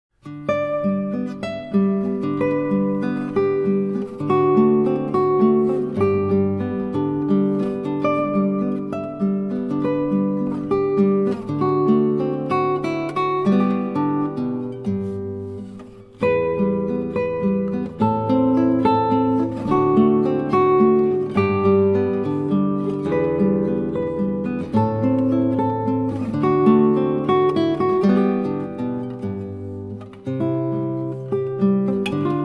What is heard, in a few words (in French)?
valse